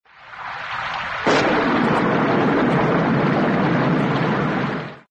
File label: Storm